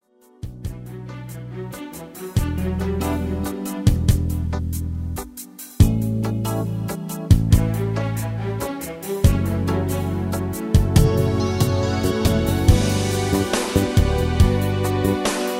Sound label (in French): instrumental